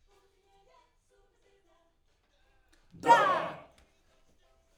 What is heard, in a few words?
Backs AKG